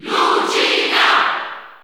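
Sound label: Cheer Italian, crowd; Lucina; SSB4; SSBU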